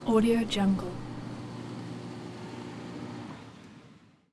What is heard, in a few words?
airport electric door noisey airport terminal; urban sounds